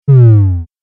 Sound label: Laser Beam Power Down